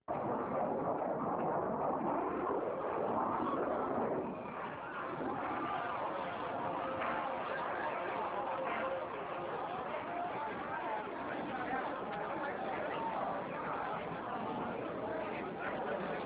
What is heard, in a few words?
crash from crowd; aviation; tbird